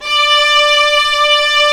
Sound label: STR, Vlas Sect, Agitato